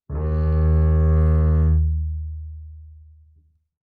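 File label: Bass arco fortissimo sul E F1, Double Bass; MIS Pitches - 2014; Strings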